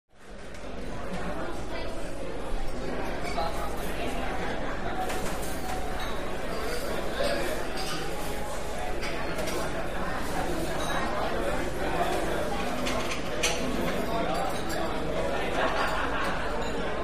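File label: Restaurant Walla; Adult Busy Walla Medium To Distant In Loud Hard Surfaced Room. Busy, With Dish And Glass Clinks And Silverware Rattle; restaurants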